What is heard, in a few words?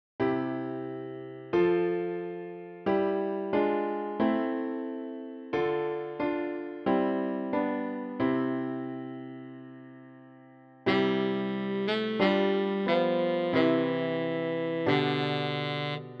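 tenor saxophone, für Tenorsaxophon und Klavier; Alle Jahre wieder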